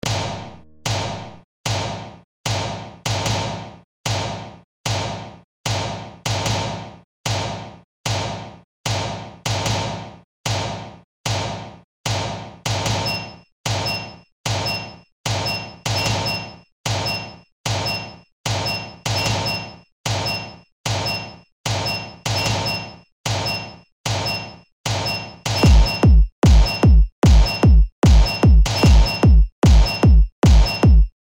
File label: newpiano